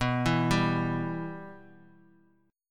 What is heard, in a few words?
B Suspended 4th Sharp 5th, chord; Bsus4#5 arpeggiated; JGuitar